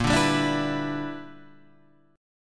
E Minor 7th Bb; strummed

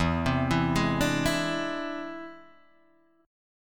E Augmented 7th E down; E+7 arpeggiated; JGuitar

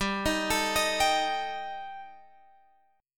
G Suspended 2nd Sharp 5th, Guitar Drop D

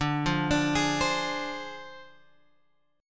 D Minor 7th Flat 5th Guitar Open G

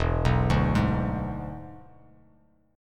E 7th E down; E7 arpeggiated; JGuitar

